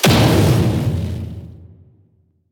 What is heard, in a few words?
damage hits explosions; World Of Warships